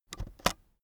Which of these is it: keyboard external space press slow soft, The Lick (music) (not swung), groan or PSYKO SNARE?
keyboard external space press slow soft